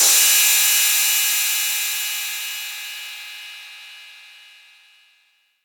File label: crash cymbal drum sample f key, Royality free crash cymbal drum sample tuned to the F note.